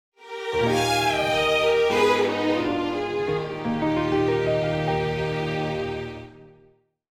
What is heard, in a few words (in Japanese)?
ジングル; tincle twinkle festival original soundtrack 2015